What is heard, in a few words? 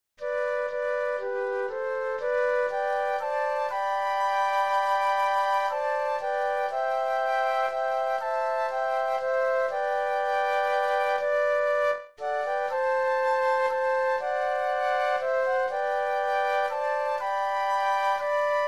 Flute Trio